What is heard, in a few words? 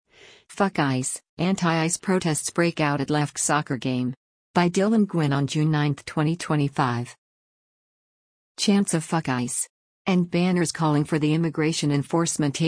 anti ice protests break out at soccer game; fck ice; lafc